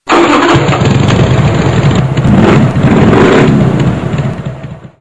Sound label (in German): Motorrad Harley; Harley Davidson; DACH